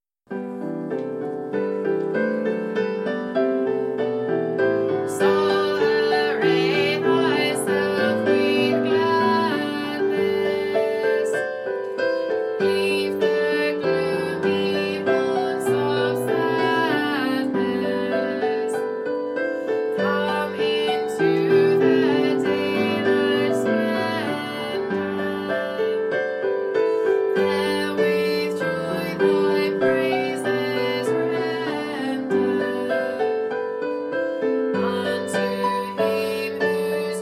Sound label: Tenor; Soul Array Thyself